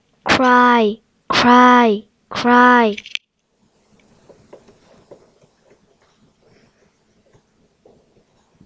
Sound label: cry